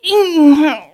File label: voice female critical